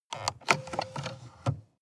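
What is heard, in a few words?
sports car interior drink holder open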